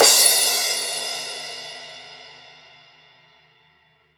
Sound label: Drums Acoustic, RAW KIT; USB Soundscan; AKAI